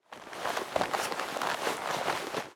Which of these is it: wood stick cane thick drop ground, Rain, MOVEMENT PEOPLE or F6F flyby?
MOVEMENT PEOPLE